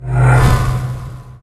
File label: heal; evoker